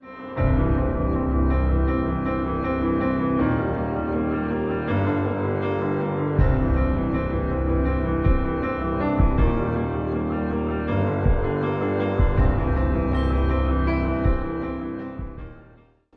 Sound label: iPhone 6s Ringtone